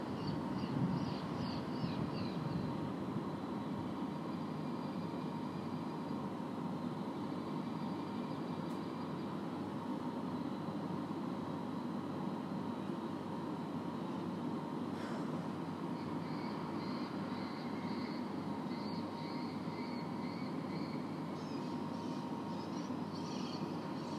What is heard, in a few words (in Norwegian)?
opptak fugl